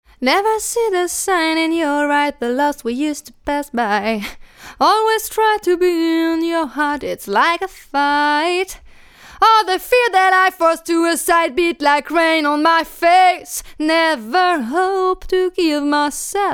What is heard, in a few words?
neumann tlm103